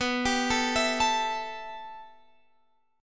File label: B 7th Suspended 4th Sharp 5th, Guitar, Open F; B7sus4#5; arpeggiated